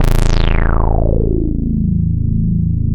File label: Prophet 5 A1; Hollywood Edge - Giorgio Moroder Rare Synthesizer Collection